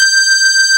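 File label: JV electric piano; Pro-Rec - Synthsonic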